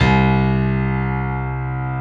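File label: C1 piano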